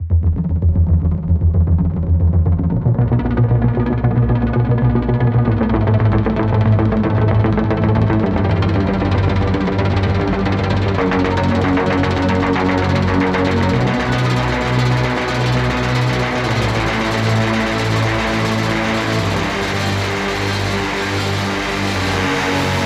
Lead; neuro; InsideInfo

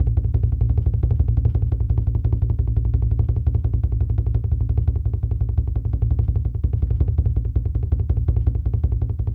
Bass Roll, African Drums, Bass Drum Rolls; Quazibeat; African Secret

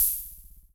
Kay CY, Kay DRM1 drum machine; 300 Drum Machines

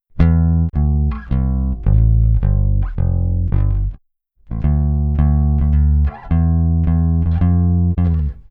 Jazz Bass, African; Sonic Foundry (Sony Creative Software); Continental Drift Aftershocks

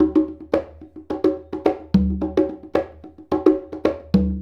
Congas; Essential Percussion

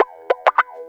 Guitar Licks; Sonic Foundry (Sony Creative Software); Ambient Grooves; Guitars

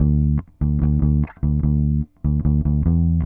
Bass, Indie Rock

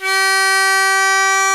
HARMO G3, HARMONICA; AKAI S-Series CD-ROM Sound Library VOL-7

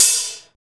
SHAKEY OHH, LOFI HATS

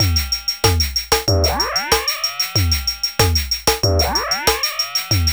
LOOP, DRUMS & PERC

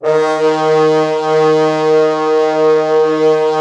Orchestral Brass

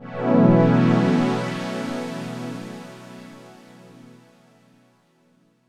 B9sus4 Silky, Chords; Electronisounds

